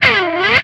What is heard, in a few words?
slide; Cookie Run